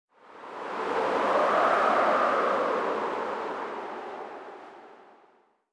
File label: Wind; blue archive